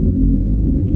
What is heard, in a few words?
drone; zaero; world